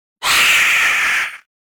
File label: Air Scream - Short Pass By Zombie; Hollywood Edge; sound designer's toolkit v2